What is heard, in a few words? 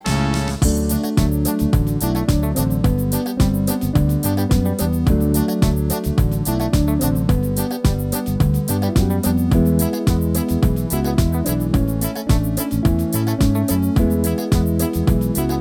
disco cumbia